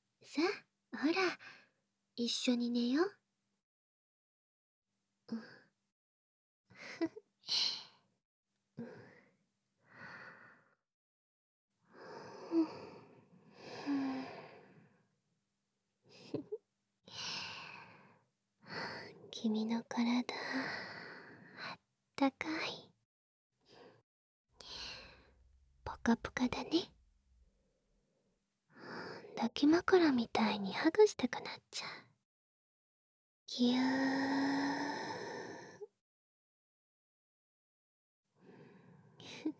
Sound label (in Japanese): 寝かしつけ耳舐め添い寝; 甘やかしお姉ちゃんの 寝かしつけ耳舐め添い寝。; SE無し